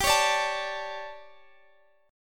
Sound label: D Major 7th Suspended 4th G